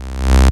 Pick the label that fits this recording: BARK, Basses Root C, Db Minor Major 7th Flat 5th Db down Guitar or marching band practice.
Basses Root C